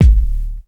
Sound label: Body Kick